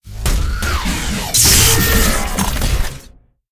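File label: death, monster; steamercrazy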